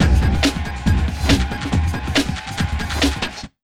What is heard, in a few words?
Big Beat Drumloops, 140